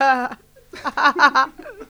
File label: mixed vocals; Houseworx